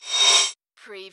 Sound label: Button Sound - Metal Smooth Slide